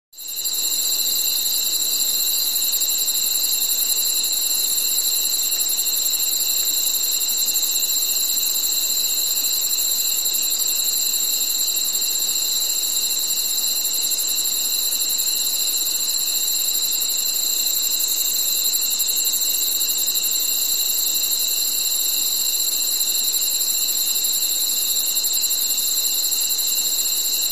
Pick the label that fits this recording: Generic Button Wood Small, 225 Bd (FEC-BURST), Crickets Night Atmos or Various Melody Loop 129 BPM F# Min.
Crickets Night Atmos